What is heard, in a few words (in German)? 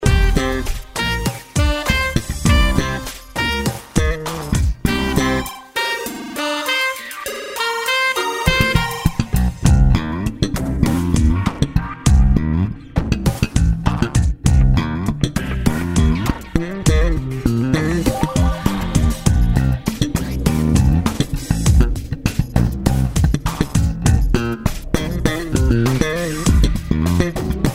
orchester groovy spa